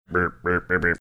making some breaking news sounds

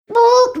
funny sound

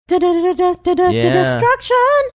singing destruction

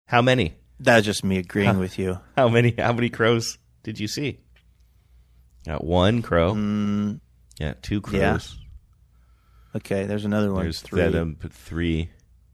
making spoofs

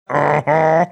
rrrrrrrrrr

